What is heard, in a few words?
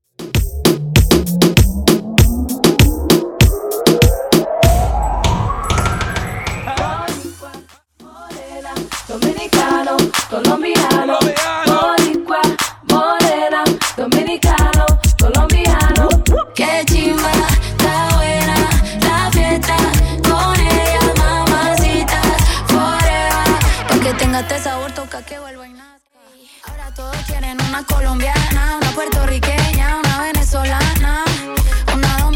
Break Intro Clean Extended 98 BPM; Jpthedj